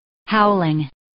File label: howling